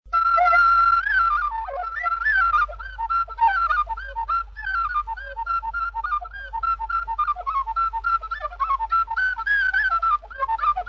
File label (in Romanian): Sarba I la fluier; jocuri si cantece batranesti; Vaslui